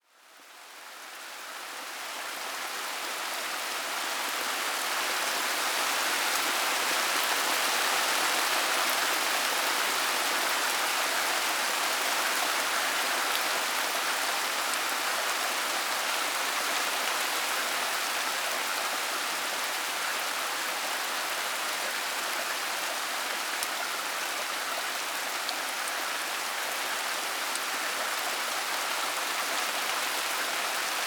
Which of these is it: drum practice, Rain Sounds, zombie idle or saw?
Rain Sounds